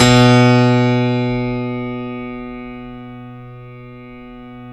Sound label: StereoGrand, Keyboards; E-MU Producer Series Vol. 2 – More Studio Essentials